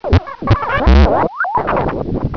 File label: child die, gonarch; svencoop; cracklife